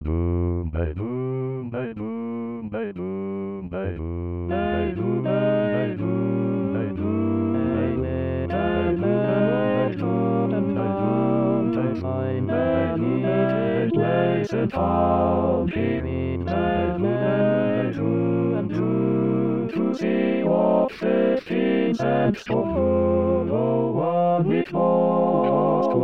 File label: all parts 120 bpm